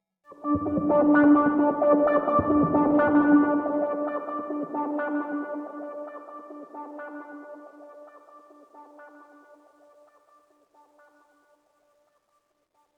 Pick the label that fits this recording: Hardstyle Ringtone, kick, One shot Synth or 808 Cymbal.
One shot Synth